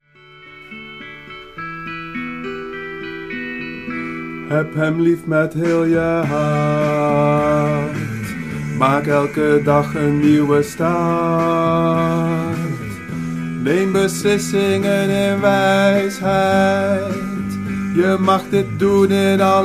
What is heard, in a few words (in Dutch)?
bas 2